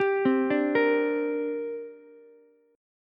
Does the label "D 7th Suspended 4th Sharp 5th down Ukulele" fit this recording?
Yes